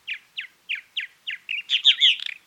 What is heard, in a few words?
purple martin